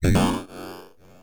Access Virus B; Synthworx

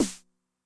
SNARE DRUM Med Tune Low Snappy, 909; classic machines; 909mod

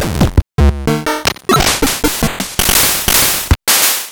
8 Bits; Retrowave

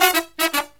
Brass Riffs

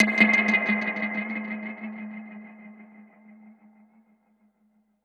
Perc Hit, dub percussion

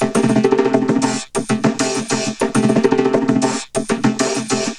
Vocobeat; Sonic Foundry (Sony Creative Software)

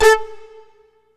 Brass Single note, Keyboards

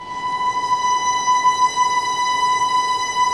STR VLN SD, Vlns Bow FX, Sord; Roland - String Master Series

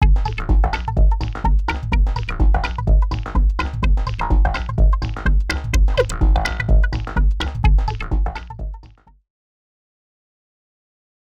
Loop Non Stop 126bpm; X-Static Goldmine 5

